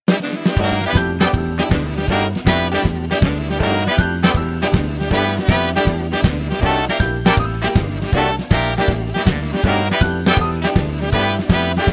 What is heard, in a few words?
Blues